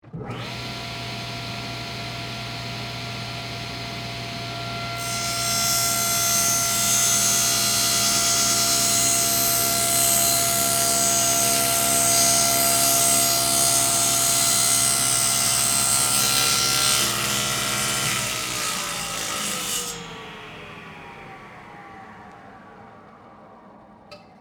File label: Table Saw Cut Wood, Industry, Table, Saw, Cut, 1X4, Wood, On, Run, Off; Motor; BlastWave